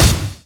Tom, Percussion; Vengeance Samples

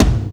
percussion; VES1 Percussion

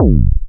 VFX2 Sub

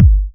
Kicks; Vengeance Samples

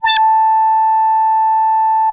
Arp D A5, ARP Odyssey, synth samples; musicradar-synth-samples

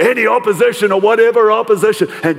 Vocal Phrases; Vengeance Samples; Vocals

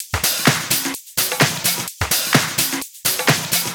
Houseloops 128BPM; Vengeance Samples

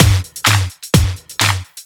Housebeats, 128BPM; Vengeance Samples; VEH2 Loops

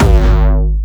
Jumpstyle Kick G#1; Bassdrums; Jumpstyle Multis; vengeance essential club sounds-3; VEC3 Bassdrums

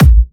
130BPM Kick; VFH1 130BPM Danzon Kit

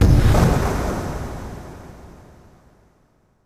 Impacts

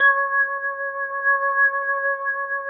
organ C#, vocal house; PS-28 Vocal House Samples; organs

